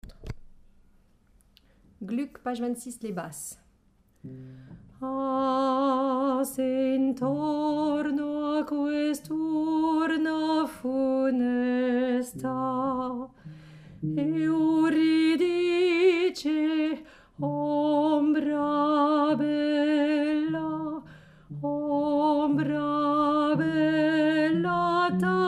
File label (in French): Basse